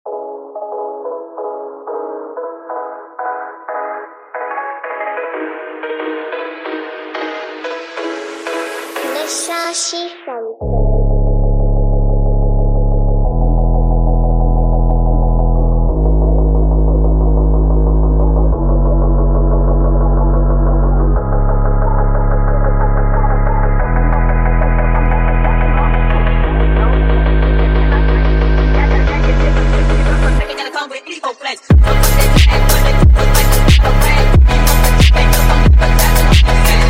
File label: LIGHTNESS Phonk; ringtone